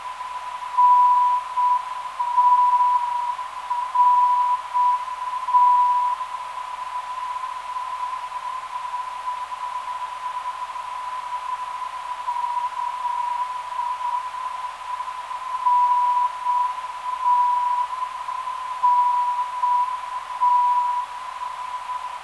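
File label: 313kHz, non-directional beacon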